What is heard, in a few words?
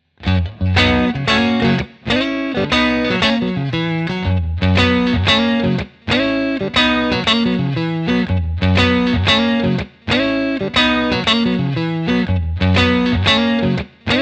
tweed crunch on